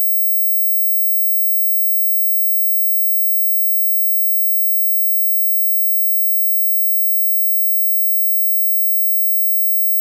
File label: Mosquito Ringtone